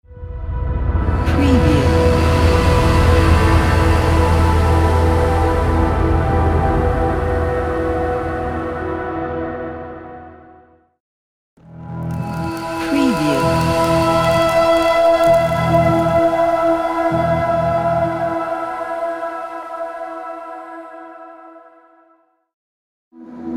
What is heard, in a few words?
magic logo reveal